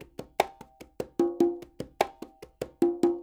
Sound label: NYC Percussionworks loops; Sampleheads